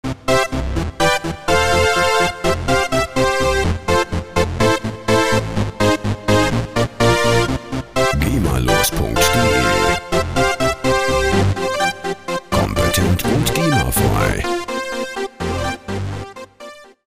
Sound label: jump brass